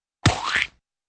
goosh

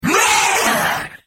demonic hit

